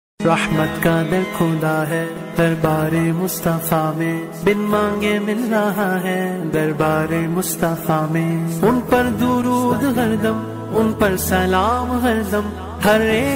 islamic ringtone

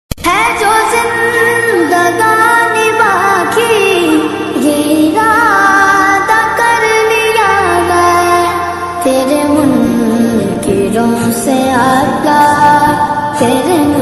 islamic ringtone

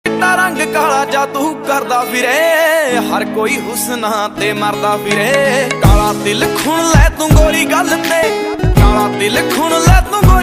punjabi ringtone

